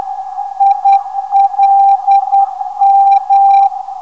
486kHz, NDB; ILM

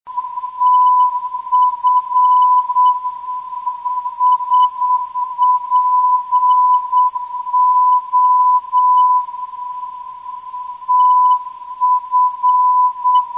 tf 315khz, ndb

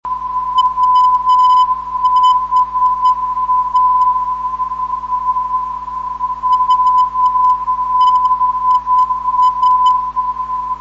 WBA 356kHz, ndb

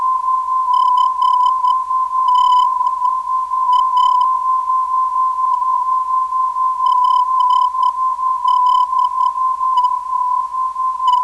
394khz, ndb; GDA

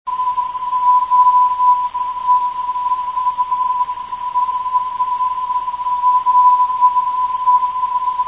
375khz, ndb

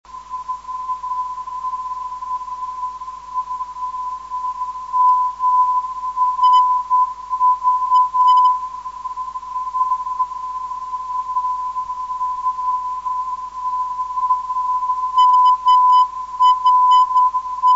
404khz, ndb

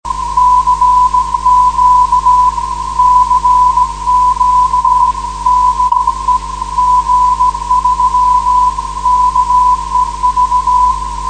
472.6 kHz; 472khz web swl; g3kev

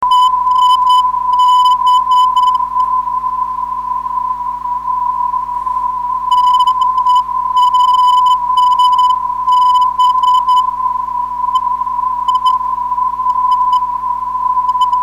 test 381kHz, ndb

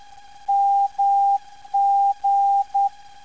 MG 296kHz

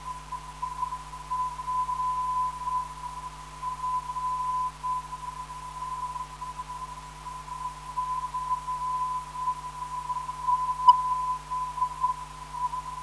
580.02kHz, NDB

